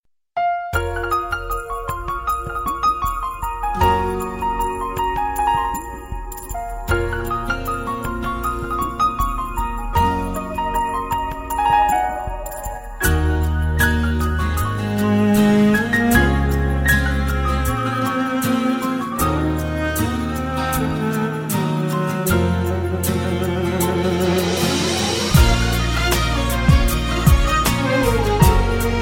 soffest tone ever, ringtones

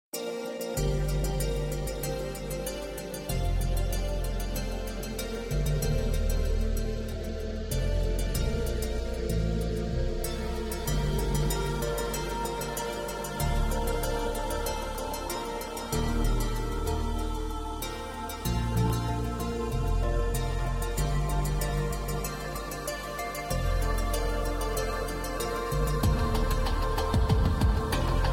guitar melody; classical

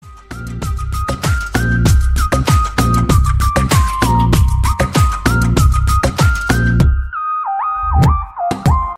Bass Tune

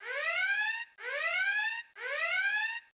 Tone Alert

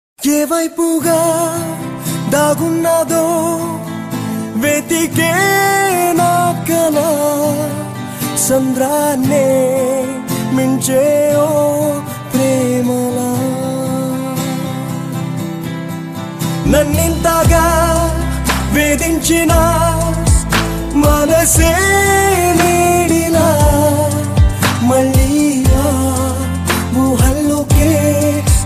unrelease bit; blues

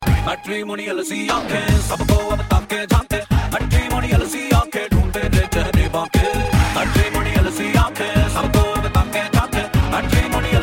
beat

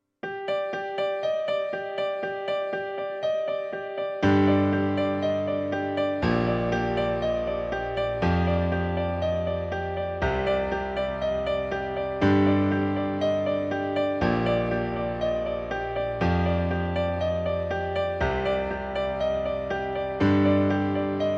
piano

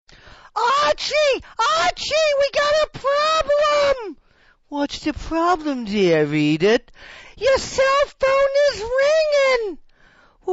holy ahh

